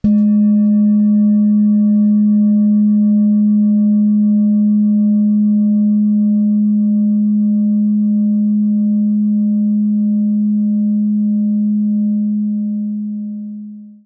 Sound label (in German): Klangschale; Mond synodisch